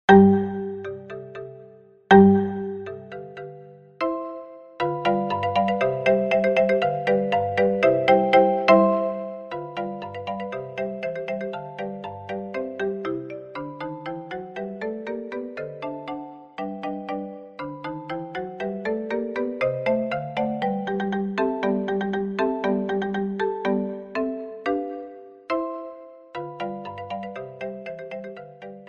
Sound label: Pizzicato; Pizzicato Polka; Strauss, J.